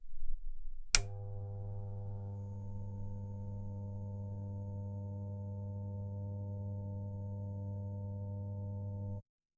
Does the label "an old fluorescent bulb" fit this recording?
Yes